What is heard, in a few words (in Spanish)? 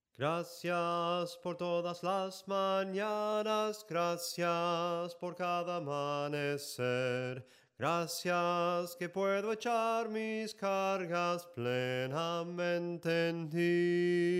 SOPRANO CANTADO, himnos en melodia soprano